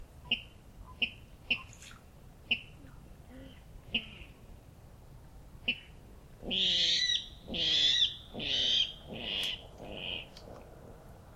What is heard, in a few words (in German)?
wasserralle